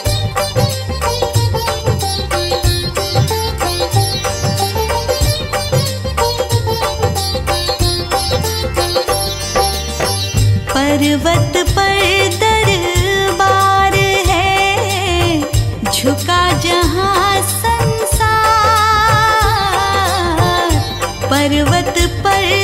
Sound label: Maa bhajan